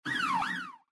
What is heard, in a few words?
Siren Alarm